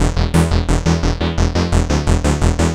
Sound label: Synth Bass